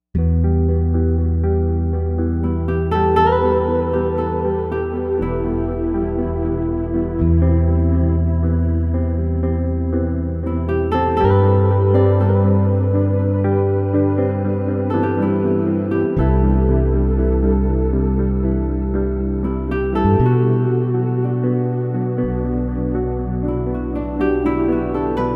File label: twin gtr arps; Jupiter-80